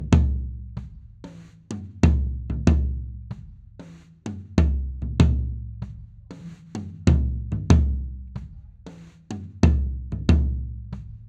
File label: tom III floor live; md 421 kompakt; mikrofonu test